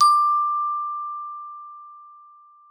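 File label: glockenspiel D4; Xsample VOL-4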